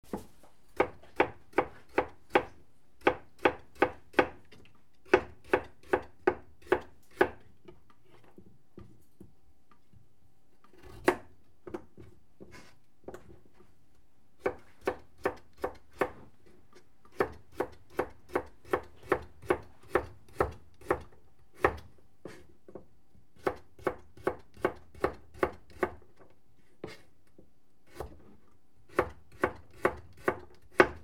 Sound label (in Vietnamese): cat rau trong bep